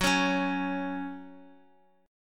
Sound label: F sharp 5th, down, Guitar; strummed; JGuitar